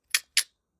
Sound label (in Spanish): Recarga pistola